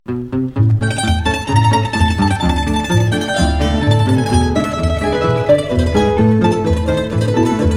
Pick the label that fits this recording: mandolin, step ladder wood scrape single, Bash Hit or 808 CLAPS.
mandolin